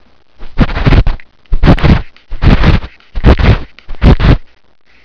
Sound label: Walk